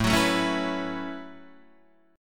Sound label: A 6th Add 9th A down Guitar Open D